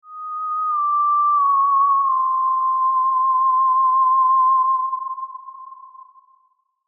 Spooky halloween theremin

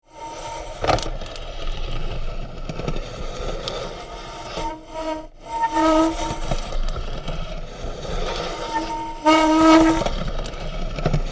Rusty bicycle slow steady grinding gears

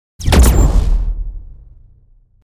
Sci fi plasma cannon fire single shot

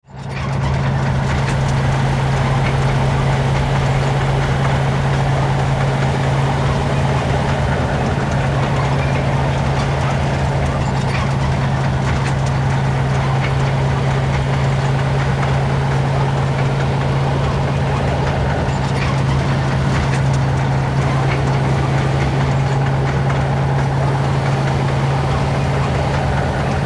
War vehicle tank moving mechanical noise

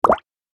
Playful bubble pop

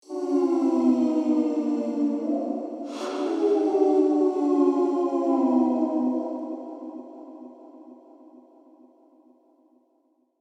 Spooky ghost wail

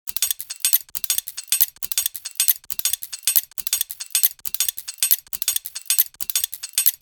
Compact machine running loop; Industrial Machinery; gfxsounds

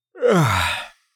Adult tired man single yawn

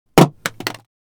Plastic item drop onto hard surface; impacts; gfxsounds

